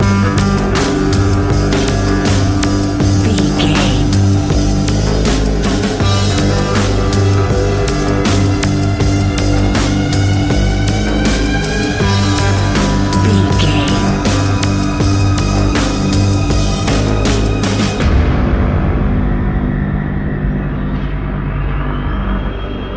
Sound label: suspenseful mystery lurking